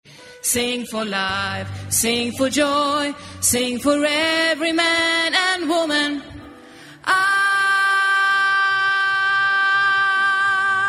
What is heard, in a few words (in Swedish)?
sopran